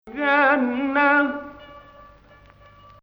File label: Ajam; 2nd Ajam 8-11